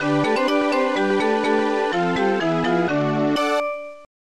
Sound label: win; F1 Licenseware - Volume 1; Win & Lose